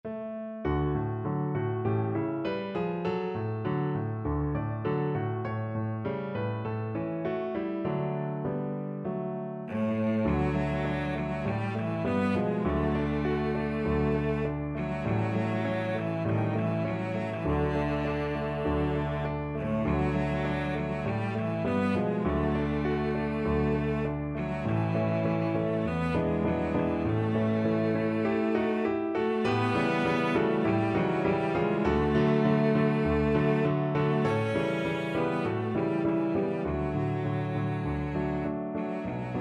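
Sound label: violoncello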